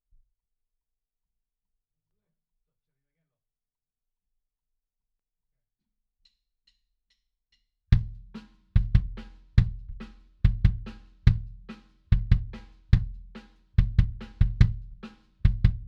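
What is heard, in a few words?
Bass Drum In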